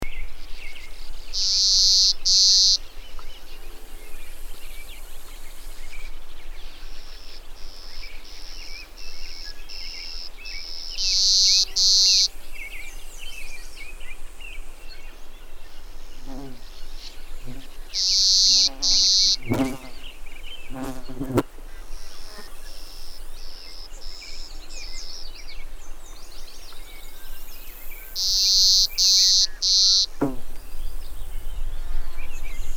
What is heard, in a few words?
Clay-colored Sparrow Spizella pallida